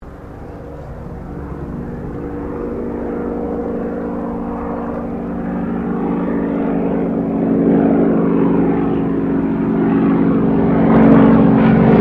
Aircraft Yakovlev Yak-52 Pass Overhead Distortion, Russian Yak52 Training aircraft